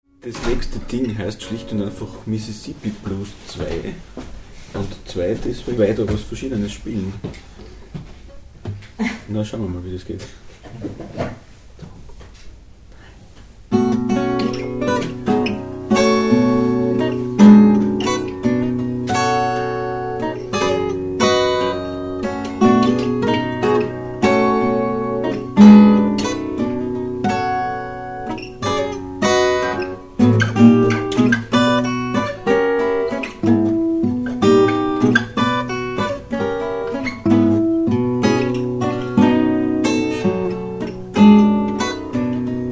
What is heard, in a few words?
mississippi blues